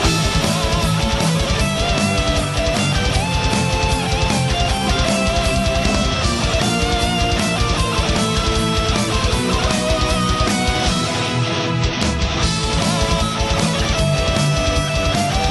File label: Heavy Metal; Zelda